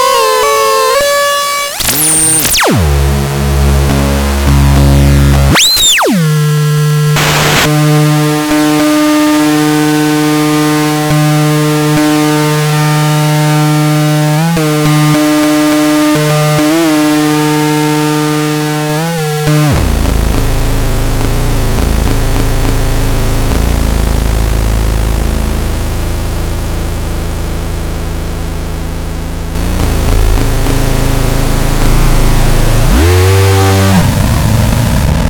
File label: I Like Sine Waves